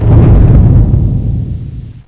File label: big rumble, ambience